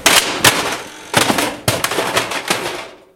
Object falling; gcompris; memory sound

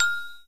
xylofon son; melody; gcompris-qt

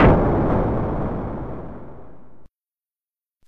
explode, effects; lmms

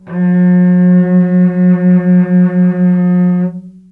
cello; tuxpaint-stamps; music; string

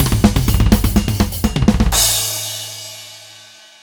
drum kit; instruments; gcompris-qt

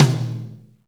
tom Rock mid; drumkits; hydrogen; GMkit

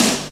rim, drums; lmms

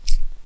scissors small open; tuxpaint-stamps; household; arttools

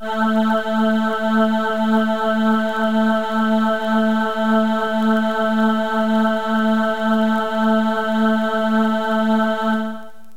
chorus; lmms

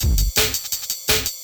break, beats; lmms

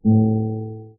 guitar; gcompris; melody-activity

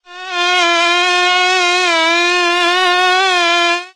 mosquito; animals; insects; tuxpaint-stamps

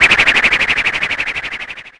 weird; memory-sound; gcompris-qt

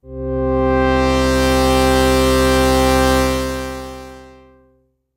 space strings; lmms

